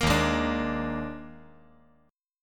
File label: D sharp Major 7th Suspended 4th, D sharp, down